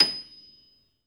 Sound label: pno D6, Classical Piano; Best Service ProSamples vol.53 - Classical Prepared Pianos; Total Piano; Brilliand Classical Piano; Classical Piano mp -ped